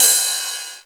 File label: 909 Ride; Quasimidi Rave-o-lution 309